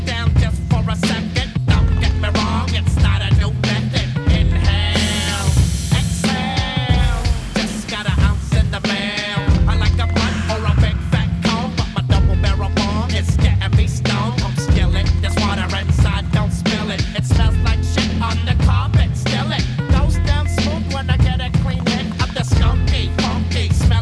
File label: hiphop